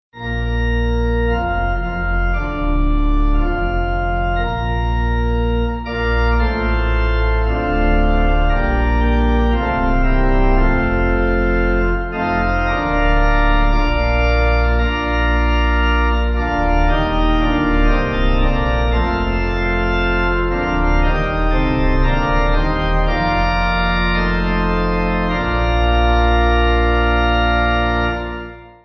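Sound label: Pipe, Pipe Organ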